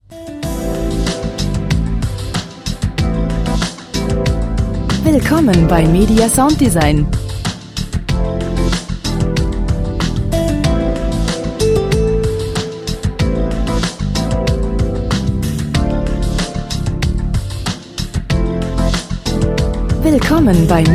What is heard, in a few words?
lounge listen and relax